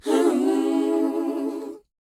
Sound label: WHOA B; Spectrasonics; Vocal Planet; R&B Dance; WHOA OO